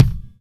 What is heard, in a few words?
Kick